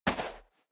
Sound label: step boots sand single left